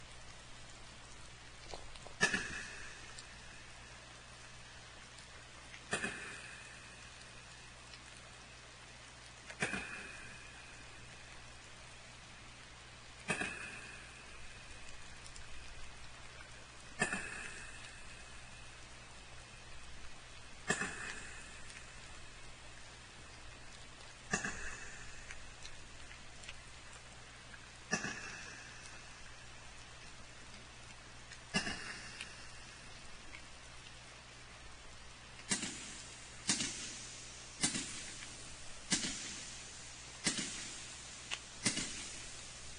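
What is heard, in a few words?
sperm clicks 2 types, whale; limekiln; Yukusam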